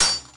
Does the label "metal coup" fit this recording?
Yes